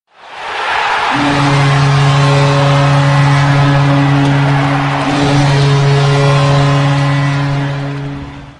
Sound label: Goals Horn